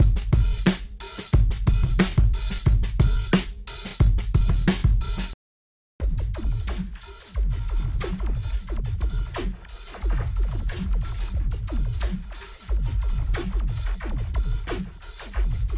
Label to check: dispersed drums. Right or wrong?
Right